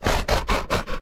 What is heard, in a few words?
laugh